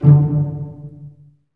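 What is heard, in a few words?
string section, orchestra; Roland - LA Composer vol. 1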